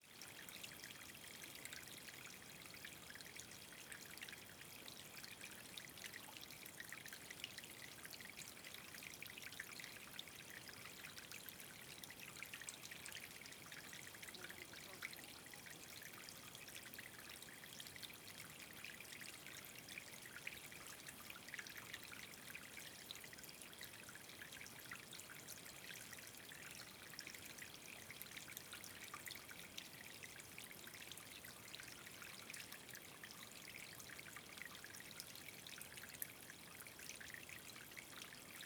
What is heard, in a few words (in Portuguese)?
Queda de agua pequena em pocinho na Chapada dos Veadeiros